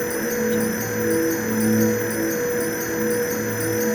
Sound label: frost magic ice bolt spell flying loop